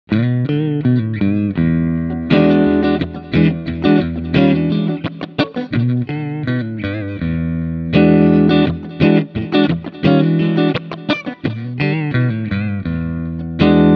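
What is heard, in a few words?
Clean Riff Front Middle Pickup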